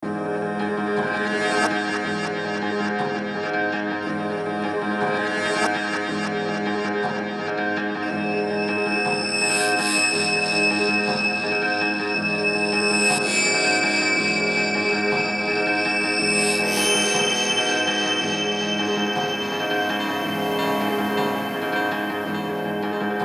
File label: piano miniature